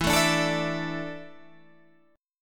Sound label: E 7th Suspended 4th, Guitar, Half Step Down